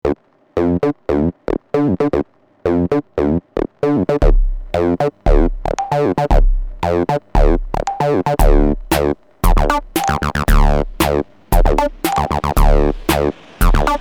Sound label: Drum Machine